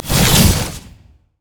deadly bloom; LoL; zyra

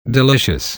spell cast effort; NunuBot

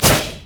arc; Diana

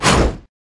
basic attack dragon; LoL; shyvana

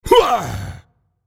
effort; Minotaur

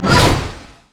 anchorchain; nautilus

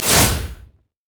fracture; LoL; skarner

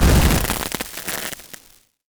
fireworks fire; Fireworks Corki; valkyrie

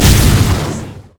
thunder strike; LoL; leesin; blindmonk

